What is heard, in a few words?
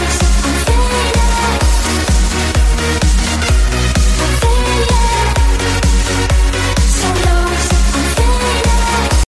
Electro Fade